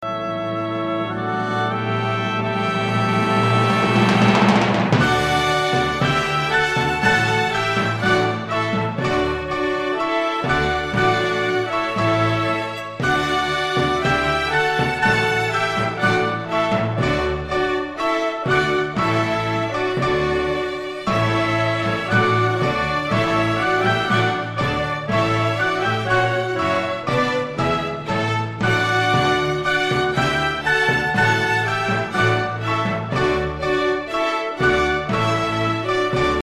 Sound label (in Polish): hymn ue; Hymn Unii Europejskiej